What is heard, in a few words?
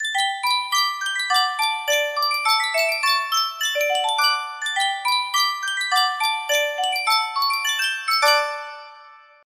sankyo miniature music box